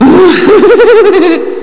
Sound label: LAUGH; psion cd vol1